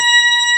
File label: B3 C 5, organ; VOCAL ORGAN; ORGAN #2